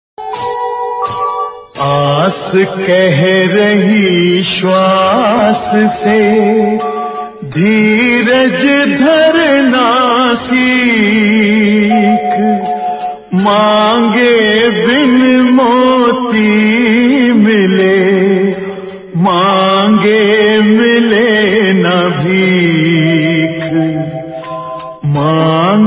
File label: ringtone remix hindi song; mahabharat